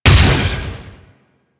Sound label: Ship Dual Cannon Shot; Crp Moa Dual Cannon